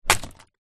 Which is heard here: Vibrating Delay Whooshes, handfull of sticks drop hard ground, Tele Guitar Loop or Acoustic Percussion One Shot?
handfull of sticks drop hard ground